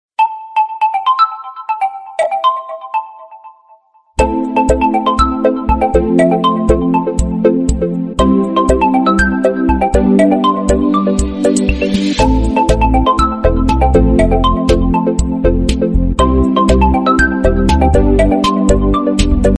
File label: tapping ball mobile call notification tone ringtone